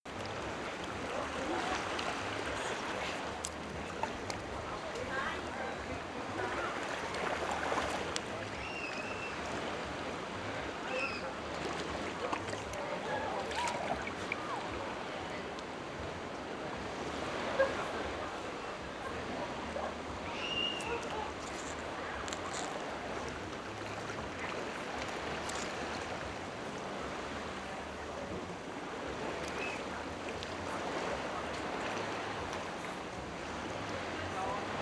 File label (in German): Beach Club Zikaden, Greece; Original Video Ton; Fieldrecordings; Parasporos